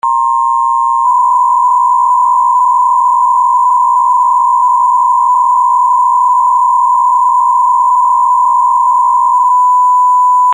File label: bpsk125